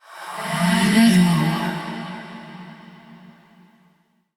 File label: Hand Compass Vocal; Warframe; Duviri